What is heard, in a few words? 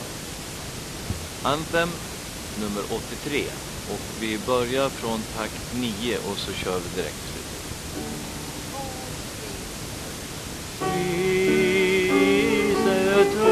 Anthem